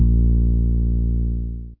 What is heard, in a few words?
bass